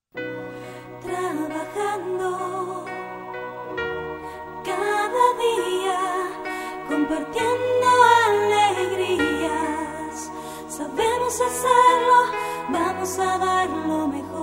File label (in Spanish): himno; telefonica